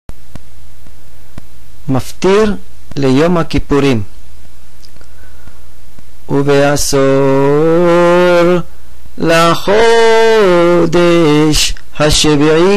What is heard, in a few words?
Yom Kippur Shaharit Maftir